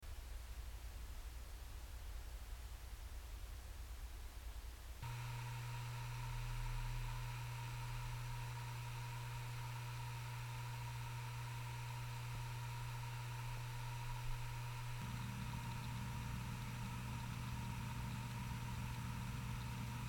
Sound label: wd5000aaks 1m